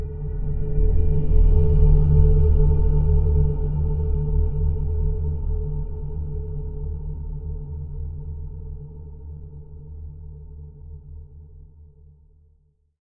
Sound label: System Drones - Dronetail; 3030 Deathwar Redux A Space Odyssey